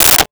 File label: Rotary Telephone Receiver Put Down; Communications